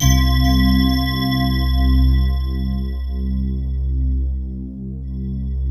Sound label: FANTASY CHF, JV PADS; Pro-Rec - Synthsonic